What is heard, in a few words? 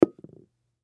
Silly String Down, Silly String Set Down Table Can; Objects; Blastwave; Foley